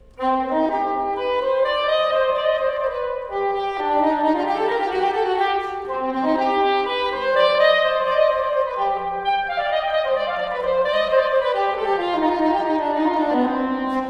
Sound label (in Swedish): Polska; Lapp-Nils